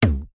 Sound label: cartoon impact bounce thud